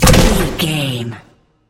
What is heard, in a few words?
sci-fi weapon rifle boomer